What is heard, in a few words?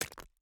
environment pickaxe hit stone